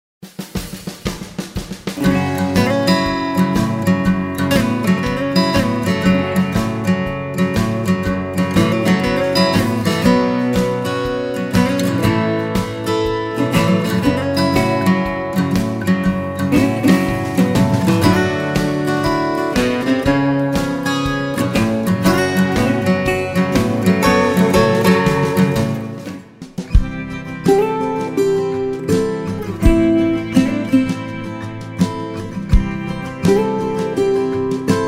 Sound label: Blues Folk Songwriter